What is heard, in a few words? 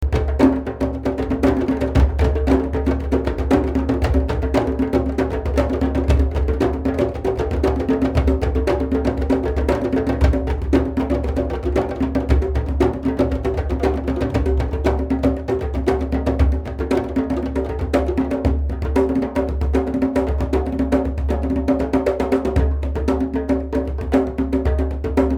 drum circle